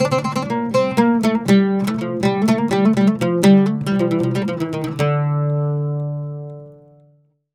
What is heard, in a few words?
120 OUD, Mediteranean Traditions